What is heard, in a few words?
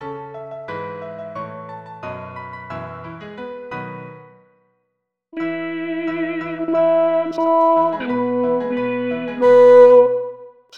bass satb